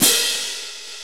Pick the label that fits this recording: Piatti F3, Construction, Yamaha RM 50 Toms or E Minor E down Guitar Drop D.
Piatti F3